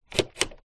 musical midi keyboard controller fader movement; zapsplat

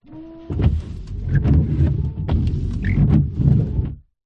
car windscreen wipers spray wipe internal; transport

